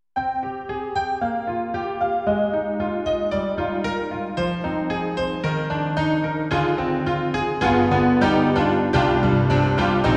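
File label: honky tonk piano, EDIROL SD-90; St.Honky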